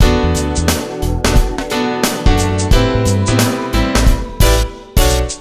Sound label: Funk acid